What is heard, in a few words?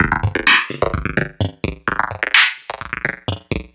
VFX2 Loop 128 BPM